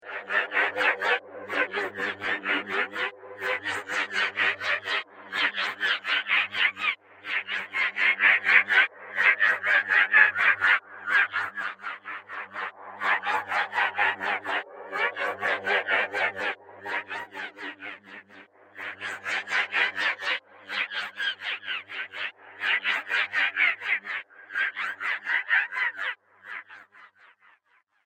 Spaceship Alien Space Wash, science fiction sound effect